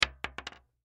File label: Bullet Shell; 9mm Drop Wood; Blastwave; Warfare; Drops (Sound Effects:Objects)